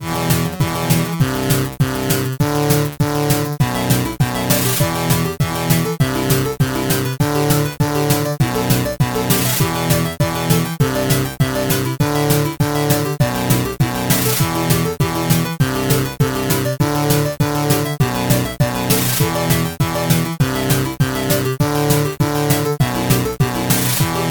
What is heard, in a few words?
heavy arcade 200bpm